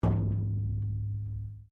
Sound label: METAL THUMP QUIET; Metal (Sound Effects:Materials)